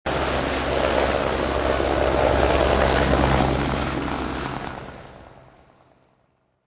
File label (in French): avion hélico